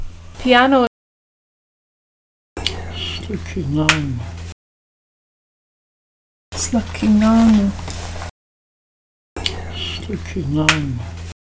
piano

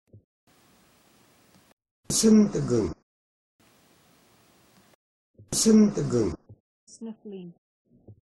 sniffling

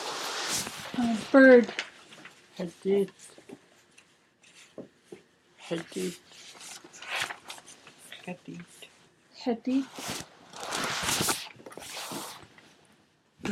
bird; X̱aad Kil Nee

